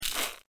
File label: Vehicle Die